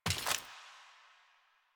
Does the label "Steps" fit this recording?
Yes